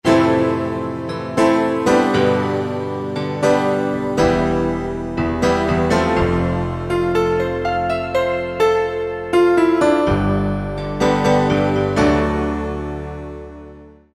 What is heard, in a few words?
EP; Yamaha TG33; EP-Arlad